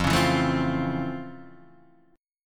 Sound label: F Major 7th Flat 6th, F, guitar